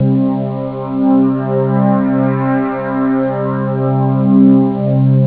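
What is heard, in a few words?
filter open; jazz jackrabbit 1; M8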